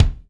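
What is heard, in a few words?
kick, hip hop, Roland; Northstar - Drumscapes Roland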